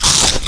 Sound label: crunch